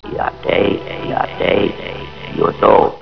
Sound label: death; quake2; ranger